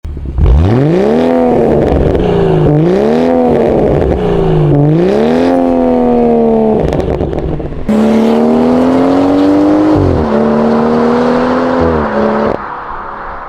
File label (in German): M140i Abgasanlage; infinitas